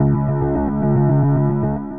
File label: Rhythmic Random Position; Ableton; Granulator III; Device Templates